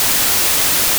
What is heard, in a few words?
noise